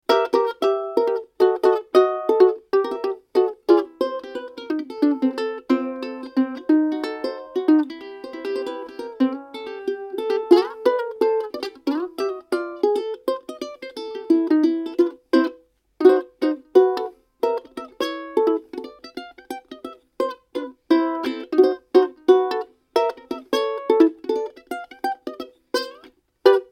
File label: concert ukulele